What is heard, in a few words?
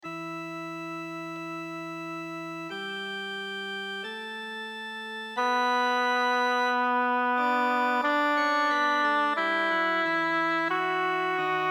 benedictus alt; victoria tomas luis de; misa ascendens christus